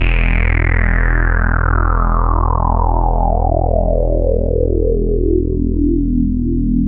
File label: Juno sweep, synths; E-MU Formula 4000 Series Vol. 3; Analog Odyssey